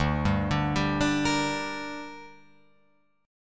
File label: D Suspended 4th Sharp 5th D down Guitar Open F, chord; arpeggiated